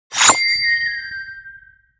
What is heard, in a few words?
Coin Big Spawn Large